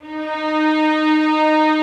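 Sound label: Vio legato D#3; Digital Sound Factory Virtuoso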